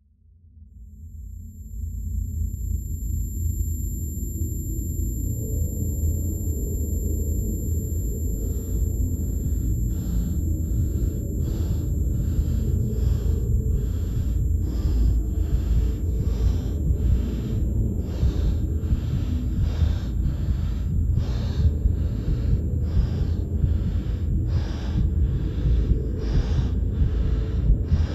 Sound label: Stun Effect With Heavy Breathing And Riser